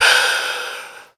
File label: breath exhale; ooh ahh